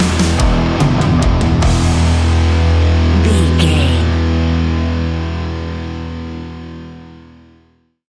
heavy metal stinger, A huge and aggressive piece of classic Heavy Metal music. Very heavy distorted guitars, powerful drums